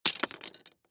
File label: Knife Drop Rocks; Drops (Sound Effects:Objects); Blastwave; Foley